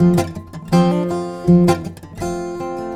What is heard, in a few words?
A GUIT F MAJ, 80BPM; Best Service; World Colours